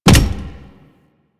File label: Step Default